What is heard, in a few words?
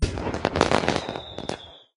firework crackle; Bej Classic